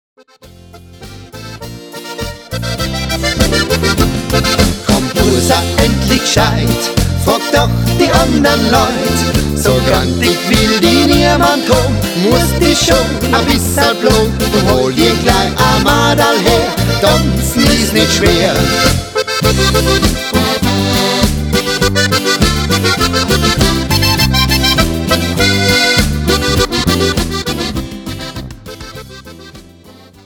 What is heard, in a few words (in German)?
bubi boarischer